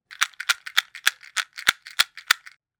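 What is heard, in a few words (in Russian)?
звук спичек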